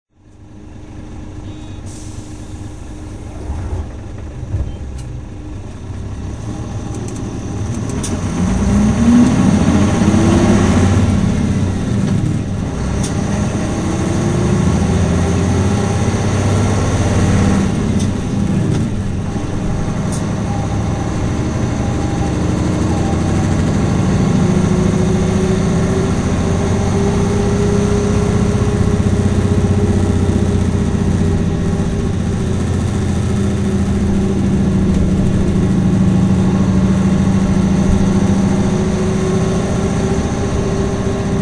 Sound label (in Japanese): 走行音, 三菱 U-MP618M; 仙台市交通局; 実 沢; 厚生病院前→八幡一丁目